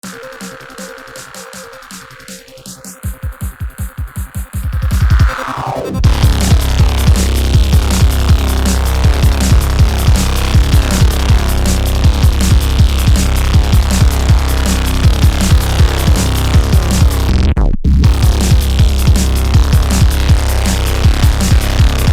drum and bass awesome but with changed reese

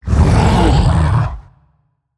Monster; Zenless Zone Zero

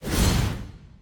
Monster; Zenless Zone Zero

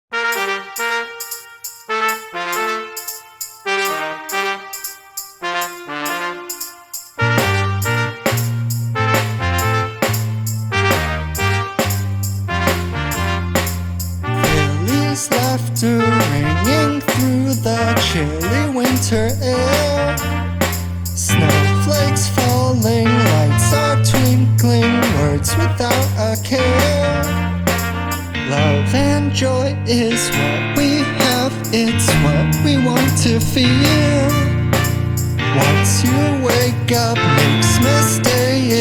Skank; Goat; SGaP General Art Project

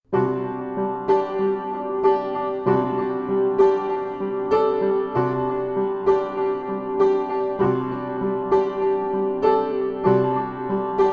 Instrumental in E minor

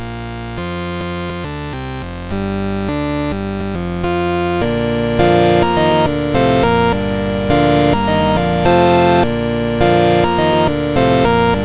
8-bit Chiptune

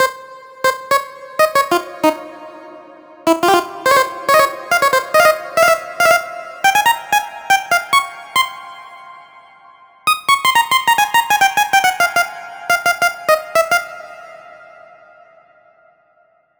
Bitlead; Pony Fan Music Remix Stems

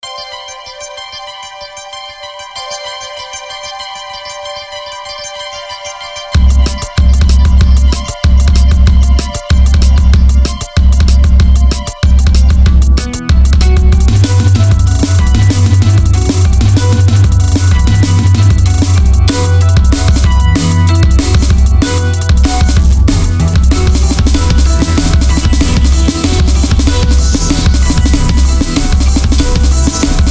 RainbowStep

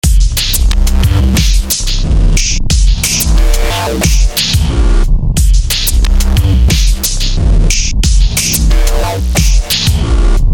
industrial feel

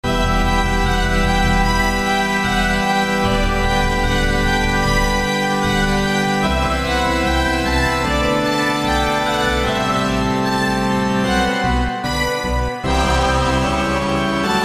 halloween instrumental; i do

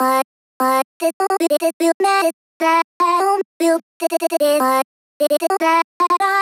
Glitch Vox

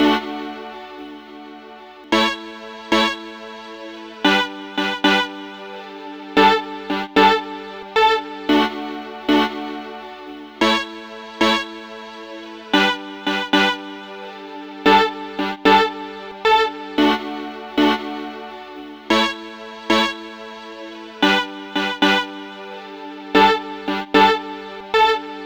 mello chord hits, 113BPM

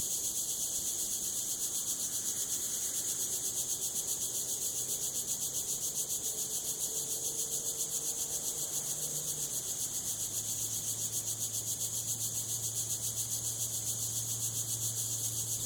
Nature Sounds

